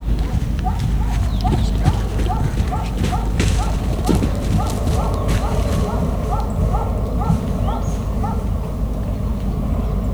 erdőben négylábon ugrál, dámszarvas; termeszetben

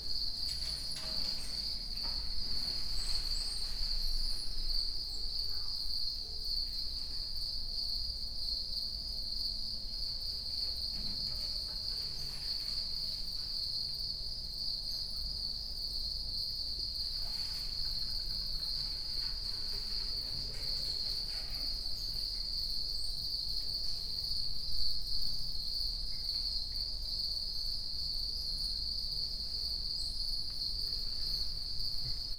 majomházba betelepült tücskök, házitücsök; debreceni zoo 2012

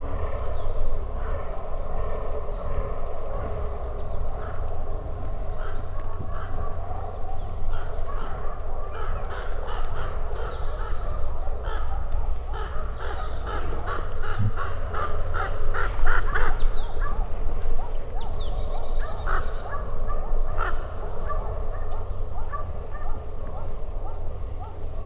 és dámszarvasok is, varjú; allathangok; termeszetben; labod

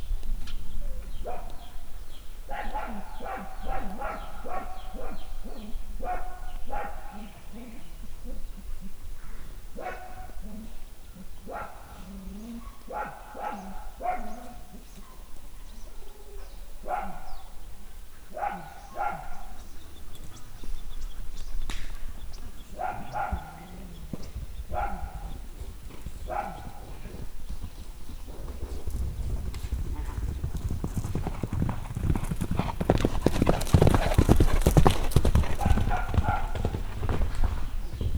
vagtatas kutya, lo; állathangok; paraszt udvar

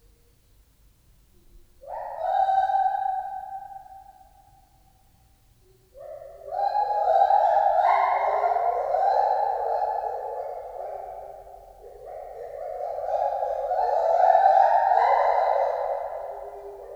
visszhangozva, fehérkezű gibbon; debreceni zoo 2012; marantz puska

